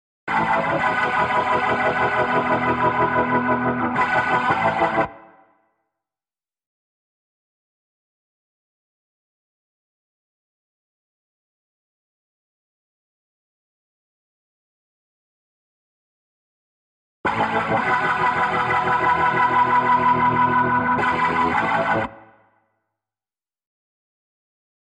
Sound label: Guitar